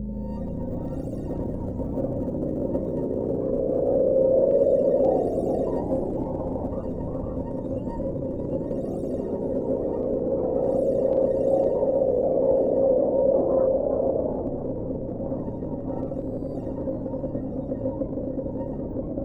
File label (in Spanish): Loops mutantes